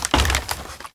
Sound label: Wood Ladder